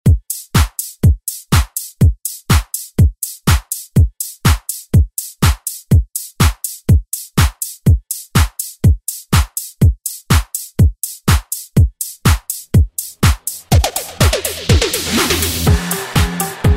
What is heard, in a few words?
Pop Redrum; Deejay Hit